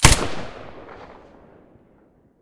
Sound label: silencer, weapons; vpo101